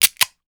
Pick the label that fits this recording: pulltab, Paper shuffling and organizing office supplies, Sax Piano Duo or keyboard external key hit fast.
pulltab